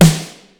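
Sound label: process snare; Greytsounds VOL-1; PROCESSDRUMS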